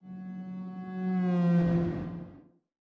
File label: Ui Range Down